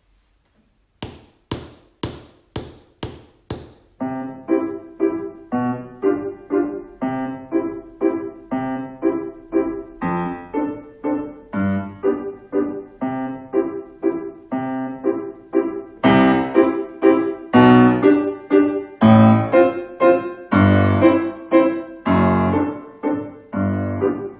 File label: Piano Duet Allegro Practice Speed; Grade 2